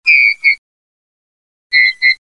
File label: Bullfinch